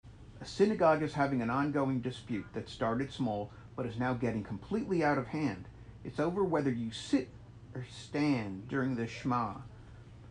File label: Laugh Track; Peter Segal; Joke